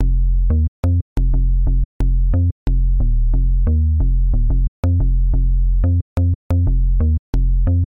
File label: BASSLINE, BPM 120; baseline junkyard